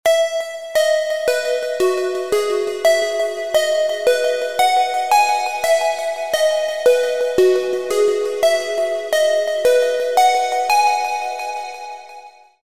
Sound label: pluck; icarus